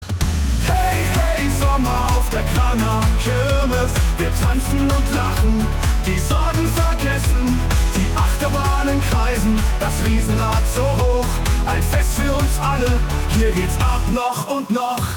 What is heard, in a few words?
Techno short, Kirmes; Cranger Kirmes Sommer 2024